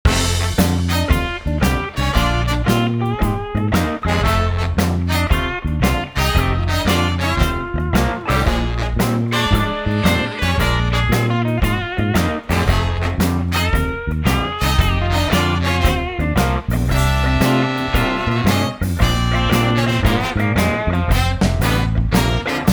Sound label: Windy City Long, Jingles; ilife